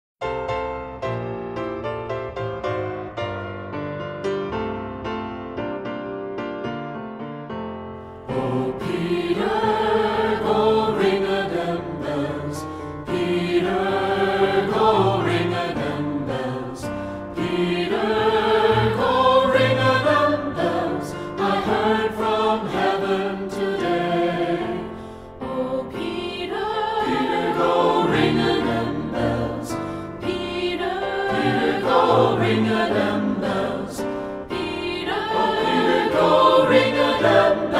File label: SATB Choir